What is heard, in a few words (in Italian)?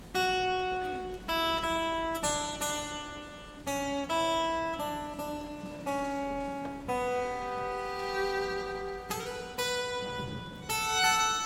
musica